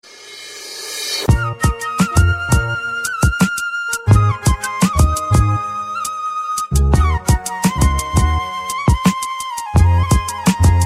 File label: supa flute, jazz; ringtones